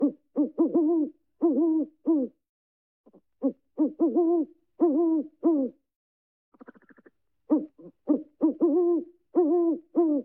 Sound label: Great horned owl, great horned owl's distinctive hoots